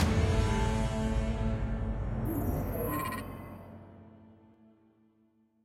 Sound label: Player Death Stinger; assassin s creed chronicles india